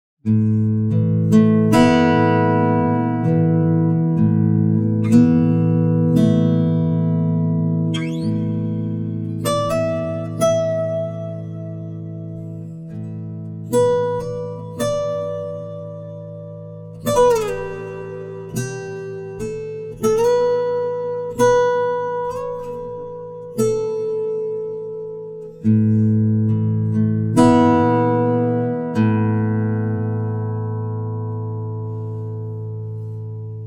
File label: guitar, timestretch; digidesign pro tools xform